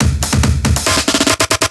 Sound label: Riddim Drum Fill 140; Ghosthack; Riddim Essentials 2020